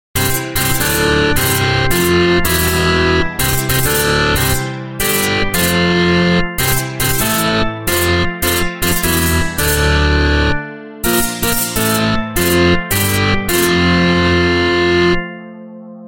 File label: happy birthday metal